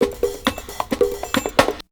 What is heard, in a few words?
Percussion; AMG - Coldcut's Kleptomania VOL-1